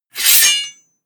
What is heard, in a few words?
scrape